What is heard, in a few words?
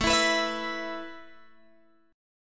A Suspended 2nd A Guitar Open G